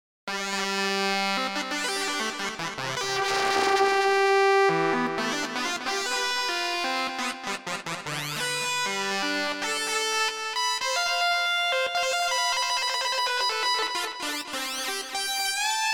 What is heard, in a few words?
Multiphonics